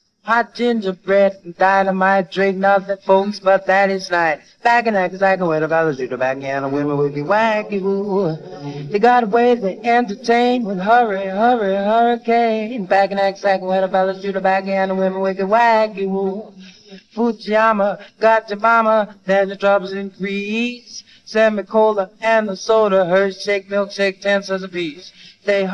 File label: vocals g major 118bpm; nagasaki